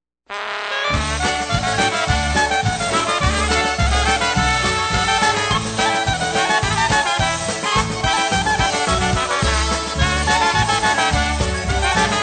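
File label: instrumental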